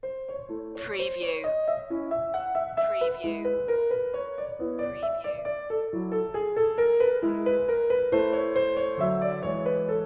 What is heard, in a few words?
piano sonata no11 a major kv331 1st movement variation 1; classical music for media